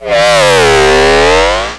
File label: pit drone alert; sectore